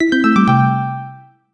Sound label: Log Out